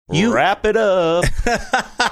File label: singing wrap it up